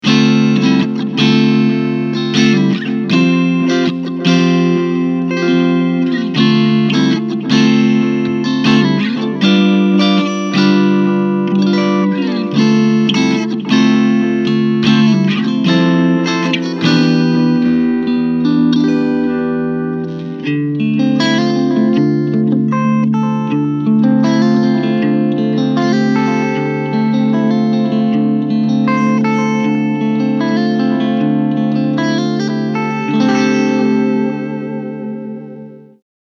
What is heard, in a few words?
Clean